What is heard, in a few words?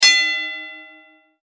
gong played; easyrpg; WitchsHeart